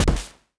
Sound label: hit lowbit middle